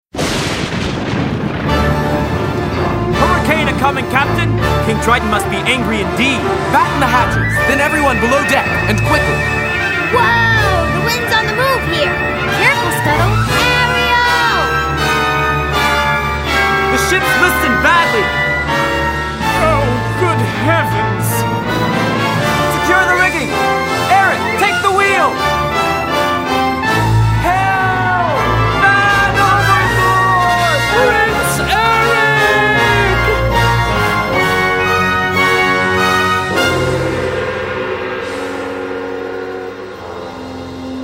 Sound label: vocals